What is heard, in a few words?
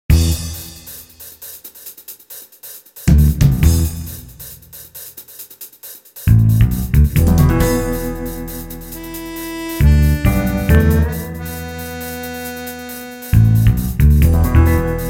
Pinball, Music; Pure Pinball